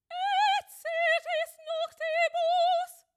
choir

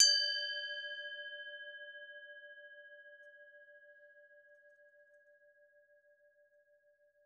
temple bell

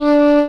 Flute D4

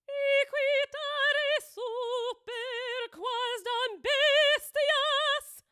choir

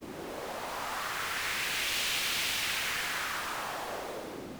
noise sub

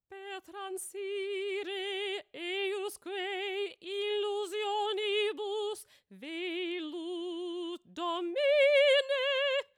choir

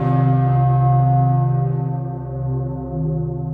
bell

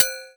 tamtam

